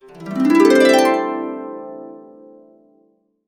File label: C, Harps; Complete Harps; USB Soundscan vol.62; AKAI